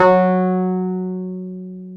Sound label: PNO, Wurly